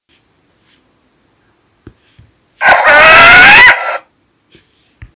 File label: Short eared female calling